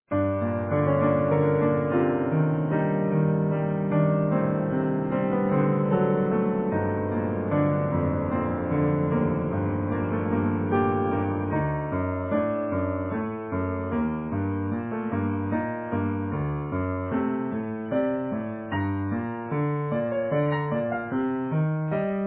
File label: Easy Piano